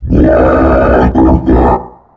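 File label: commando voice hurt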